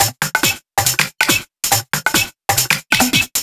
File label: Loop 140 BPM; vengeance essential club sounds-3